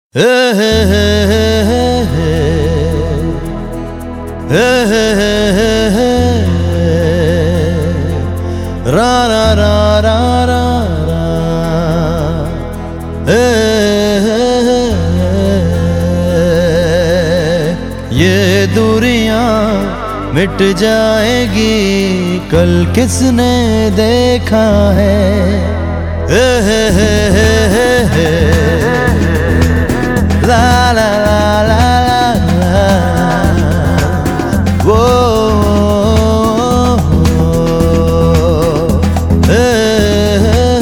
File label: (Romantic Version)